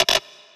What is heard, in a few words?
snare oneshot, Pop Sample Pack